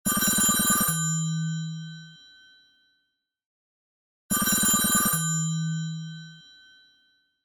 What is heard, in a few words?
Telephone Bell, ringtones; Samsung Galaxy S25 Ultra; Retro